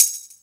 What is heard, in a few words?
SHAKERS; Best Service; Dance Mega Drums CD2